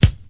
clear kick drum sample e key, kick drum tuned to the E note; kick drum samples